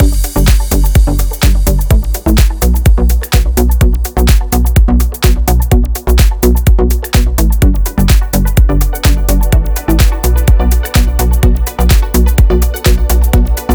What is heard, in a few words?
126BPM Mix; Vengeance Samples; Klondike Kit